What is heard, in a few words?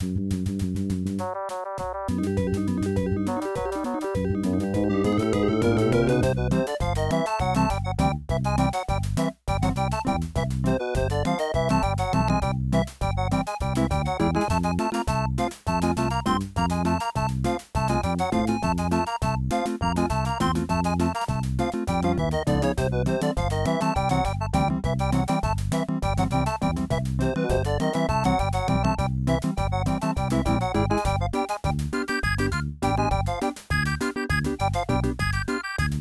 Polka; the incredible machine; ibm pc at